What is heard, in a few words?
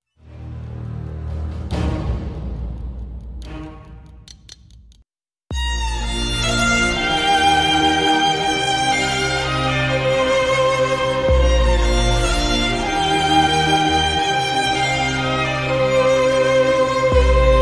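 background music ringtone; raja rani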